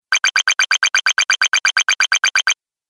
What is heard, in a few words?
Retro Videogame Bonus Collect